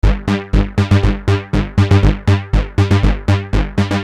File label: Syn Bass 120 G, Techno Synth Loops; SynBs; 120bpm; Sonic Foundry (Sony Creative Software); Signals I